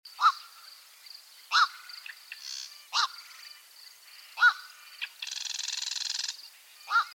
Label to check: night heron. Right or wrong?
Right